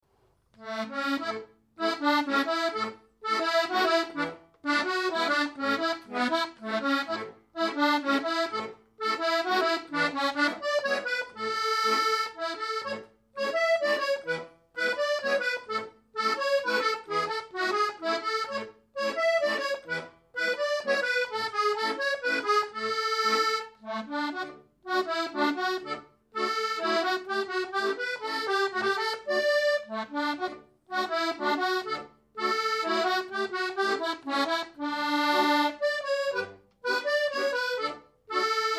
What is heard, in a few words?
mazurka; sonny's mazurka